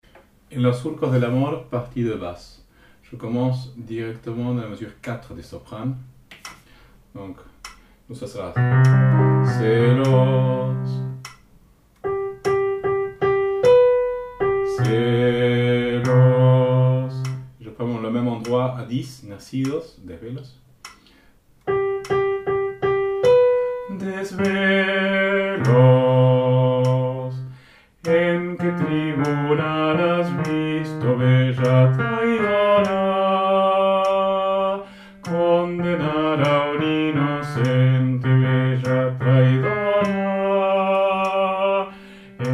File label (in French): Basse